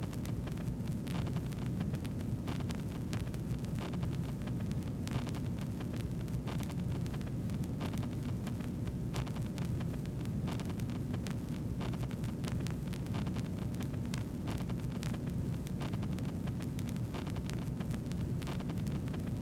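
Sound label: Faked Vinyl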